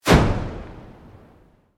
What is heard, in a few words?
Sweep Organic Fast